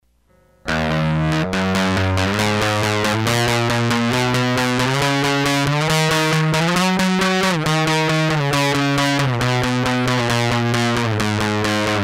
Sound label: Theme Electric Guitar Rift; Agent Kios; the story of agent kio